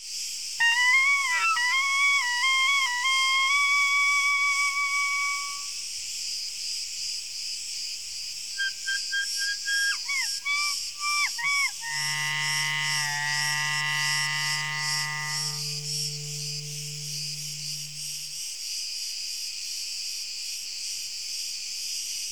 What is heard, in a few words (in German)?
Grillen Frei Hoch Reibton Spiel